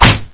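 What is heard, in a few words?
fist hit body; dn3d r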